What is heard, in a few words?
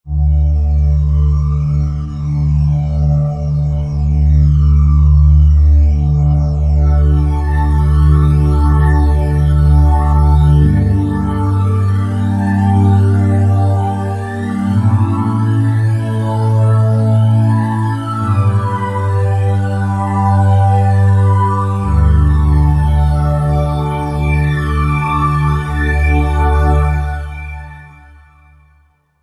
Jet Pad 2, Roland JV-2080